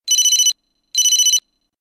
Business Double Ring

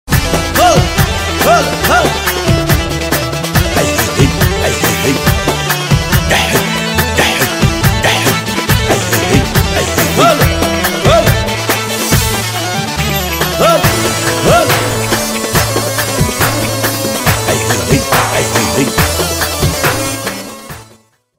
Arab funny ringtone

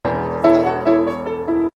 Cash Machine

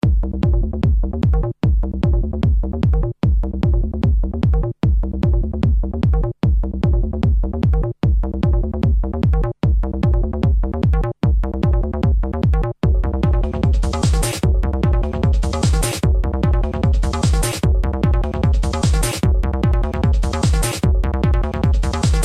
Huge Rock Tone

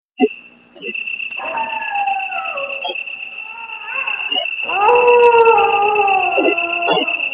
Zombie Dog Howl

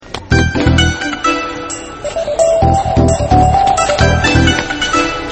Alert

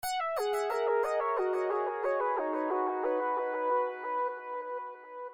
Echo Nokia

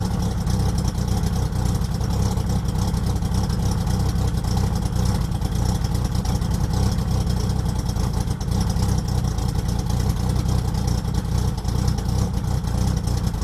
AMC Jeep cold start

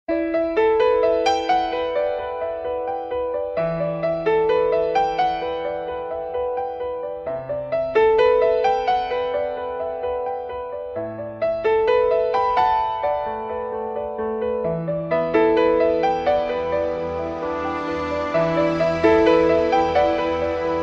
Soothing Piano

